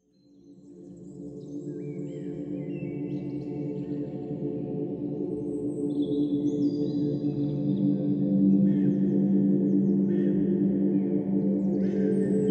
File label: Alpha Brain Waves Meditative Music